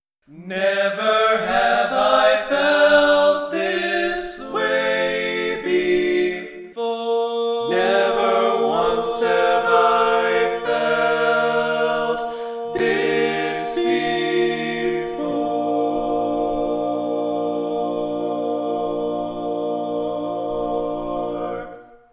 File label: Tenor